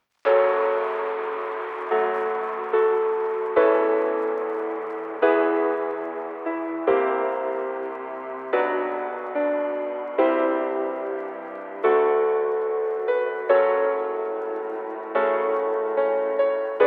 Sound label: instrumental